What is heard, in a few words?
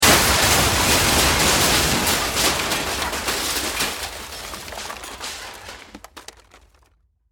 Impact Crash Massive; Crashes; Objects